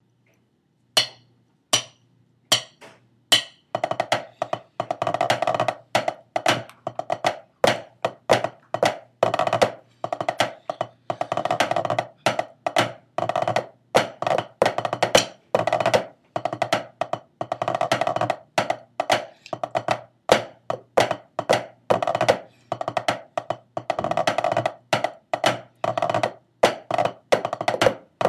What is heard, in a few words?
snare tenor